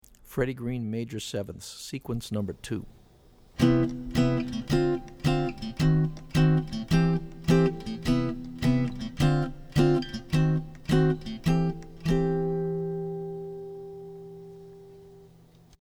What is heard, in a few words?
Maj7ths Seq; jazz chording; Freddie Green